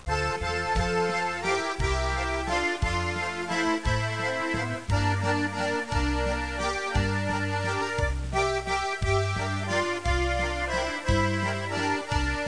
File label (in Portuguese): trilha; GEOGRAFIA